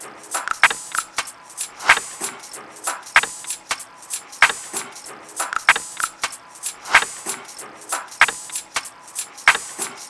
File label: Industrial Party Perc Loop 95 BPM; Drum Loops; Cymatics; Cymatics - King Drum Loops (Teaser)